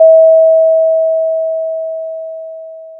Sound of a doorbell

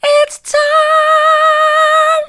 it's time soft, vocal

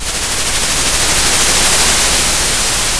pop, Sound of a thunderstorm

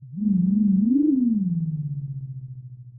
Sound of an owl

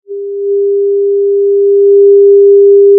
pop, Sound of a cruise ship horn

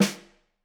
Snr, drum

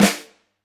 Flam, drum

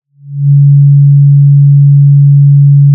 Sound of a cruise ship horn

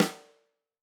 Tam Snr, drum

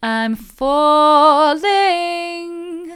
i'm falling mid, vocal

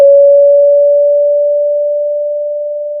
pop, Sound of a school bell

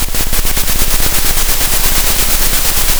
pop, Sound of a train

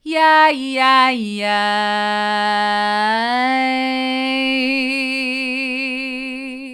yi yi yi hard, vocal

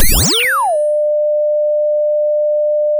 pop, sound of a bike bell

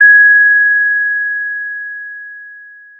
pop, Sound of a doorbell

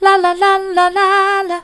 la la mid, vocal

